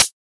low treble foot hi hat sound B key, pedal hi-hat single hit tuned; hat samples